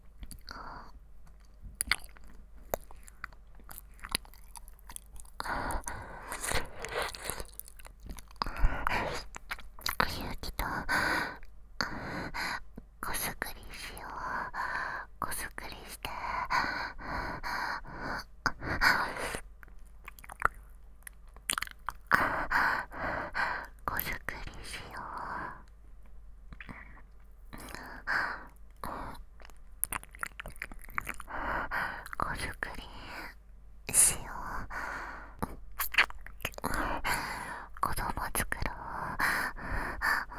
耳舐め 喘ぎ

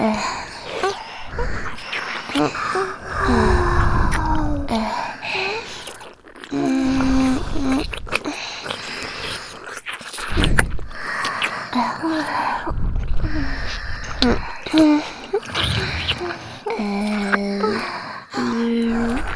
吐息ベロチューループ

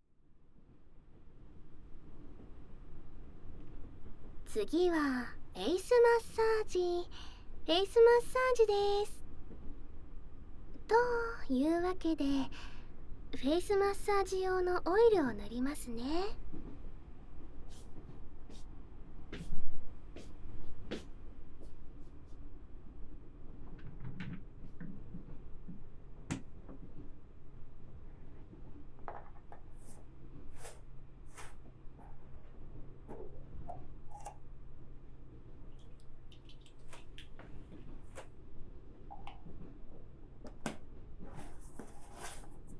フェイスマッサージ, ASMR

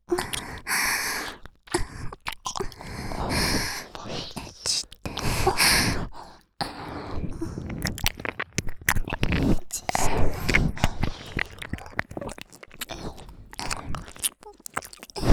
耳舐め 喘ぎ; エッチしよ♪

